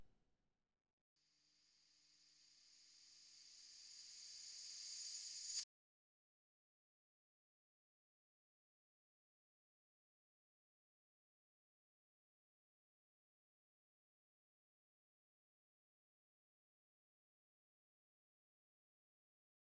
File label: risers